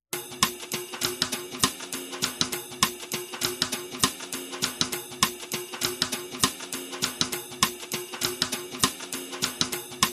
Fairground Hip Hopper, No Glockenspiel No Organ Mix